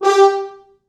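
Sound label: 4 French Horns, Horns; G3; East West; Quantum Leap Horns Sax; 4 FrHorns MOD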